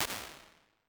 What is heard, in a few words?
Halls Slap Hall R, impulse responses; Ableton Live 12 Lite